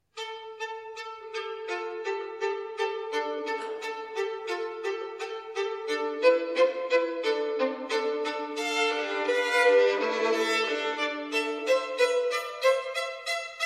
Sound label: Violin solo